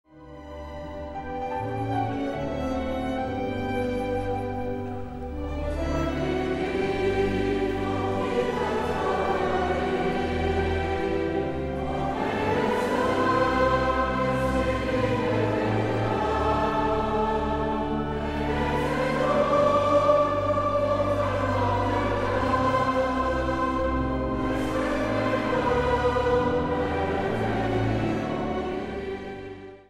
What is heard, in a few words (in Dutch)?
Psalm 116 vers 1 7 8 en 11